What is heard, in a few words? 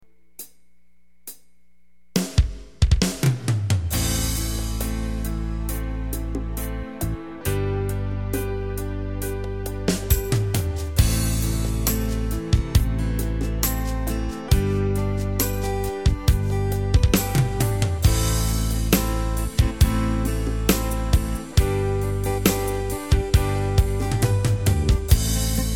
slow surf; yamaha